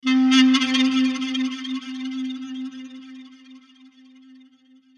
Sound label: Synth One Shot